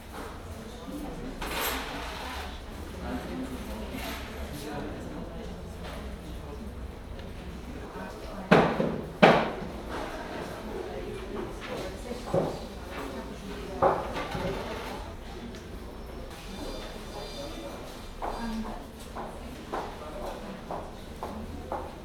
post office; best service Blue Box

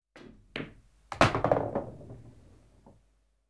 Billiards Balls Into Pocket; KMP Film Video Sound Effects

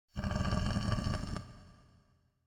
Monster - Distant Death - Short Breath; Hollywood Edge; sound designer's toolkit v2

